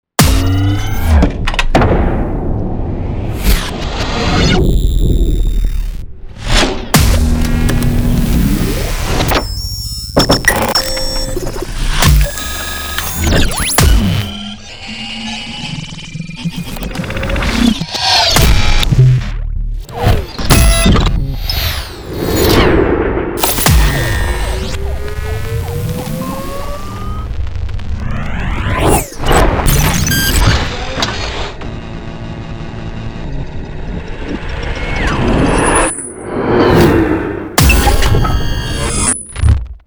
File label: Mech and GUI Sound Design; Joe Edwards